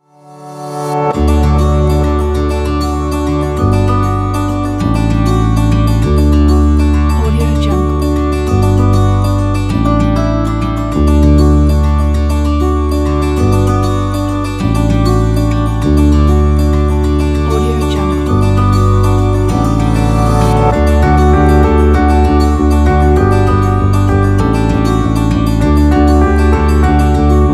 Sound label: acoustic piano guitar